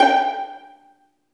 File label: String Vlns Pizz